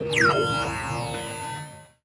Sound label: new Menu Pause; unsighted